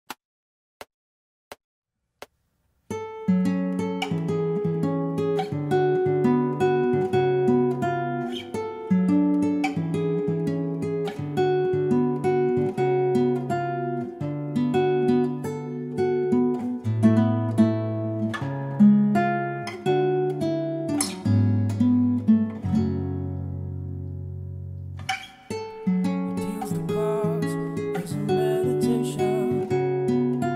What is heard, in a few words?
guitar cover